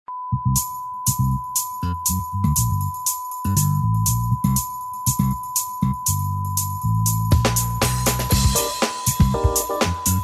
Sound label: smooth melody jazz funk